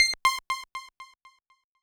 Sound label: C5, Synth Line; 90 s Sample CDs; NiceBeats; SynthLine VOL-1